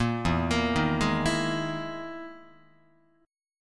E Major 7th Flat 5th down Guitar; EM7b5 arpeggiated; 1,0,1,1,0,3; JGuitar

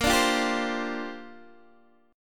Bb 6th Add 9th Bb Guitar DADGAD

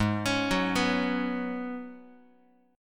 G sharp Minor 11th G sharp Guitar, chord; G#m11 arpeggiated; x,4,4,4,3,x; JGuitar

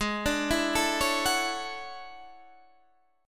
E 13th G sharp

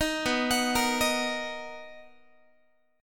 B Major 7th B down Guitar; arpeggiated

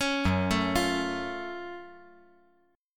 Gb Minor Major 7th Gb down

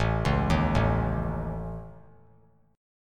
A Major Flat 5th A, chord; AMb5; arpeggiated; JGuitar

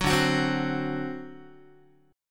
D 7th, down, Guitar; D7 strummed; JGuitar

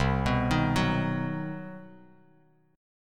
C Minor Major 7th Flat 5th chord, Bass Standard; arpeggiated; JGuitar

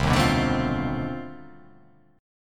C Minor Major 13th, chord

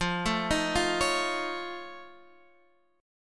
F Augmented 7th F, chord; F+7 Chord {X 8 7 8 6 9} arpeggiated

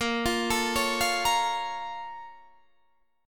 Bb Minor Major 7th

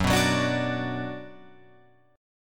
Gb Suspended 4th Sharp 5th, Guitar, Open F; strummed; 6,6,6,9,9,9

